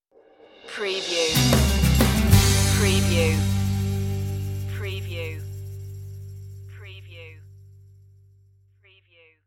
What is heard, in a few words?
crash stinger